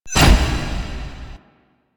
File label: Stairs; Warframe; Entrati; Vaults